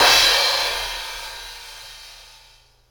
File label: Slice Crash, Cymbal; KJ Sawka; Drum n Bass & Beyond; Drum Tool Box